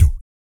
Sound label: BIG BREATH; ILIO; Vocal Planet VOL-2; Blues & Soul